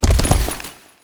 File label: horse run